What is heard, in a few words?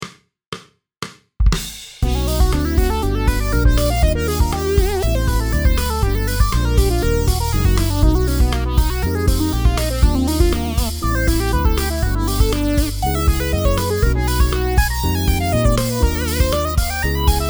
Funky solo at 120bpm